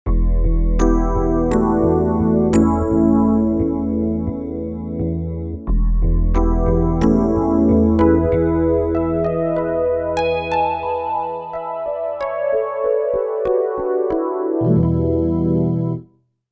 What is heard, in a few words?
Dig Rhodes, Roland JV-880; Factory Patches